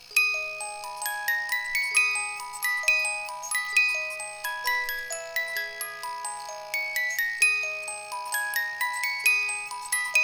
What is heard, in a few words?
Music Box Loop